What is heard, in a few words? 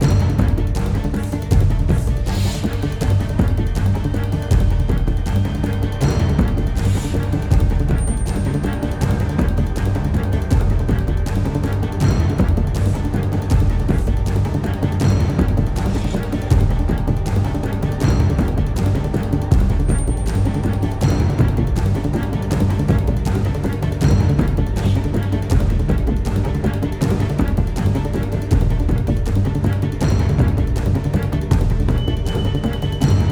Loop Tense; the walking dead michonne